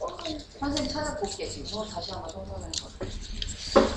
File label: knife